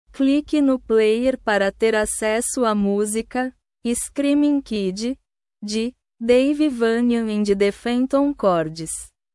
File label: screaming kid